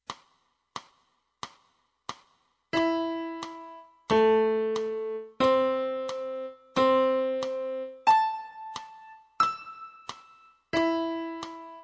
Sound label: piano; Notas na Partitura